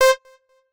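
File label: Beep Lead High C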